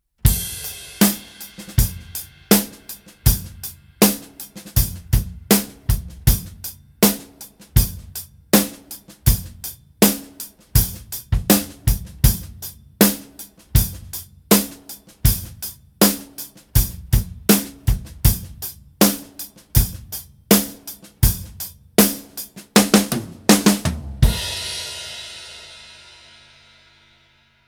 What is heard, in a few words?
Slow Rock Groove